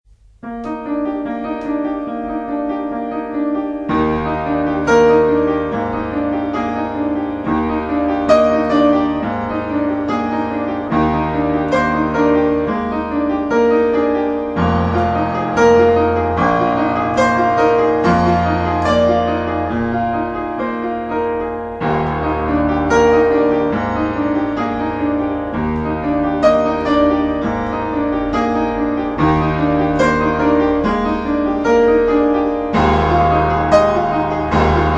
PRELUDE EN MI BEMOL MAJEUR

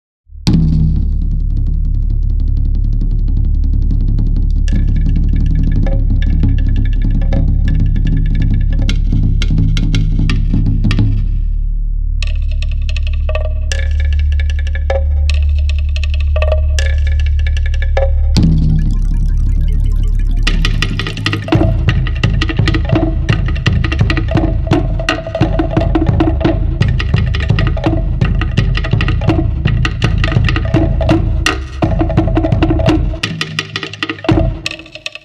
TAMTAM DANS LA NUIT

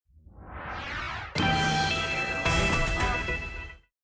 JINGLE COURT

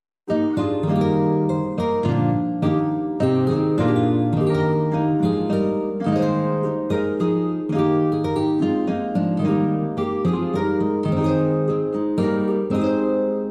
SARABANDE RENAISSANCE

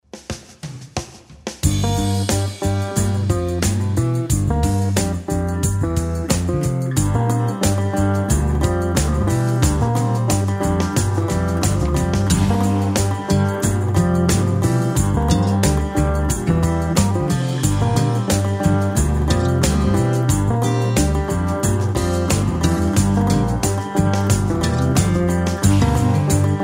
GUITARE

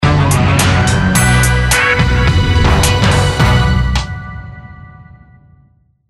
virgule in investigation

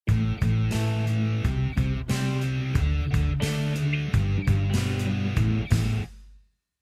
GUITARE BUBBLE HARD

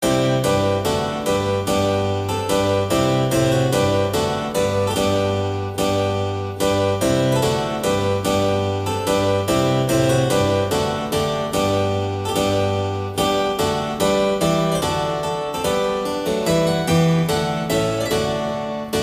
GAVOTTE

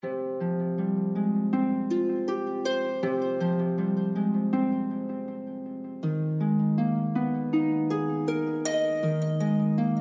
SERIE ATTENTE HARPE CELTIQUE

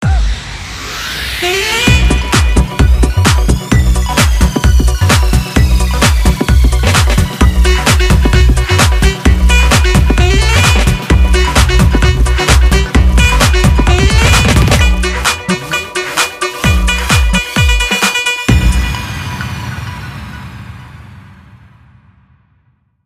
generic et ça vous amuse sans rires

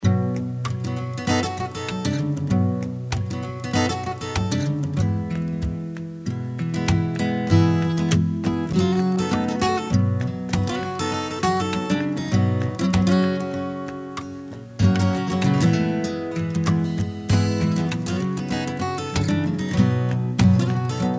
GUITARE

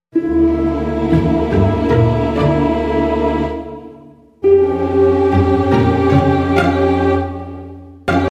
TENSION INTERROGATION

